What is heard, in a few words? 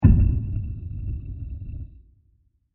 Deep Growling Impact; Miscellaneous Impact; Impacts; Crafted Signals